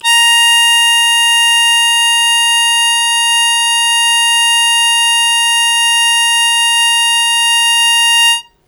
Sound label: Loud Attack Sustain Bb2, Harmonica; Zero G - Harmonica